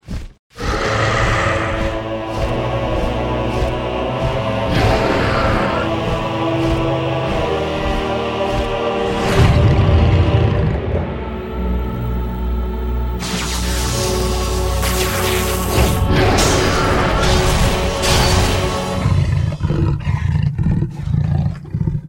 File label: Boss Intro; Demon Lord; Dungeon Defender; DunDef